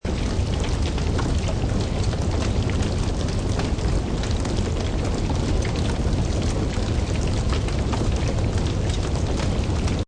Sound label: Fire Flames Crackle Loop, Medium intensity fire burning evenly, cracking and popping flames; General fire, wildfire or housefire. Seamless loop.; LynneMusic